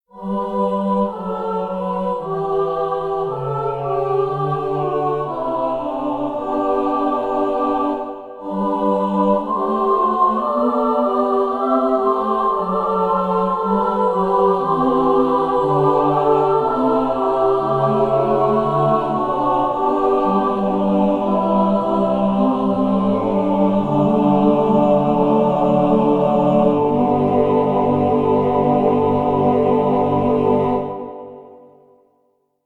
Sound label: Choir